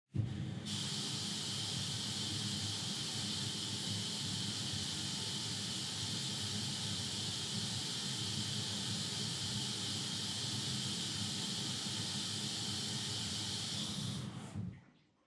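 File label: Washer Front Load Operation Noise Far Pour Water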